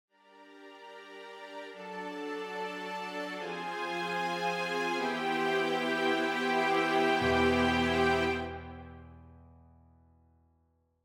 Game over you lose string sad

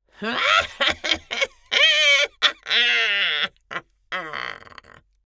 Old lady evil laughter

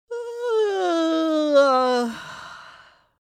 Witch fantasy vocalization yawn

